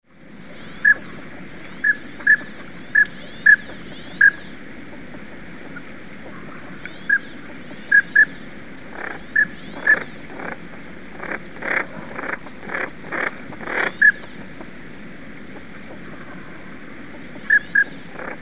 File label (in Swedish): Gulnäbbad stjärtand